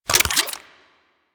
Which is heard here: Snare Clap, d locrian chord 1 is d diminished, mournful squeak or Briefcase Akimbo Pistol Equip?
Briefcase Akimbo Pistol Equip